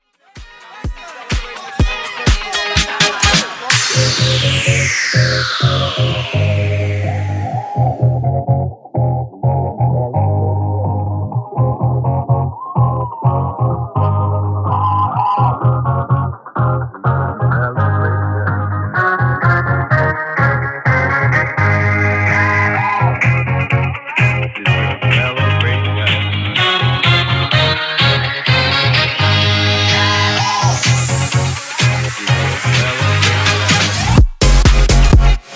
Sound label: 80s Re Drum 126 bpm